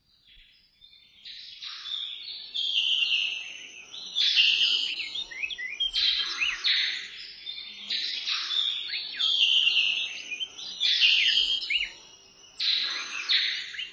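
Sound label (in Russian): ptitsy lesnye, forest birds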